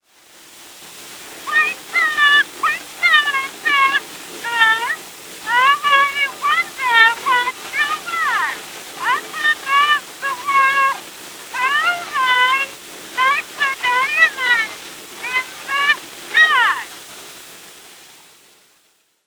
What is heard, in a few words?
Twinkle, twinkle little star; Excerpt of poem by Jane Taylor